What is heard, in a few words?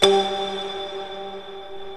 STEEL DRUMS